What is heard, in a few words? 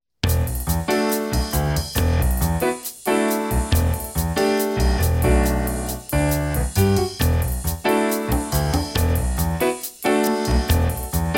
Electrophonic; TAPE